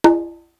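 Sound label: dynamic C, drum kit; East West; Global Sounds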